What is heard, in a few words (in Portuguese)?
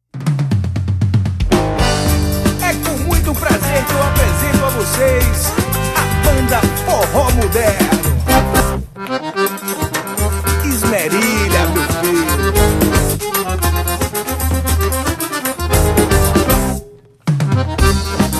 forro muderno instrumental